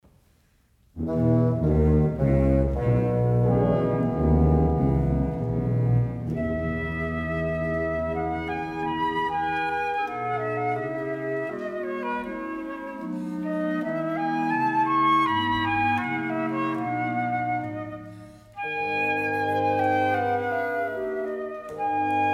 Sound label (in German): Floetenkonzert